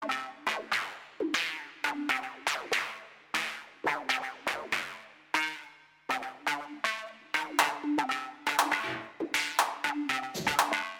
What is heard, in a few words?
VICTORY (Orches